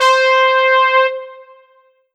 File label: Yamaha EX5 Classic Brass C5